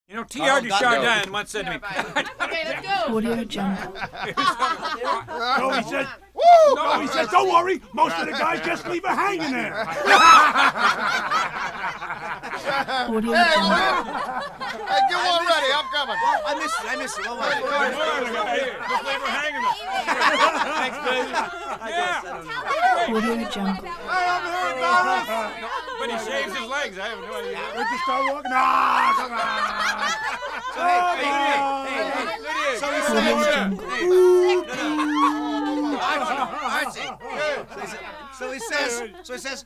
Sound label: bar walla constant rowdy conversation hollering